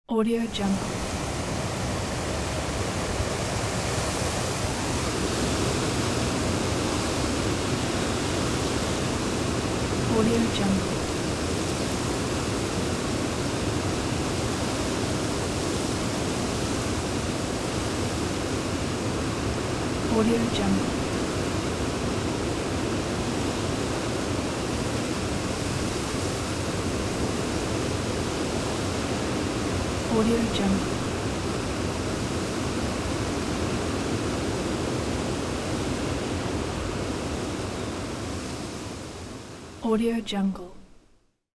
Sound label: strong wind near the coast; ed soundmaker